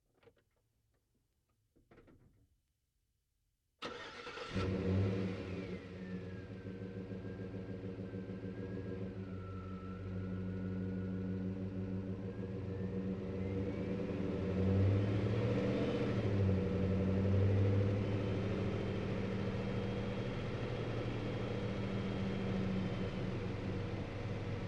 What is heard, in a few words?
Truck GMC Savana Onboard Drive Accel Decel Slow; Trucks; Big Room Sound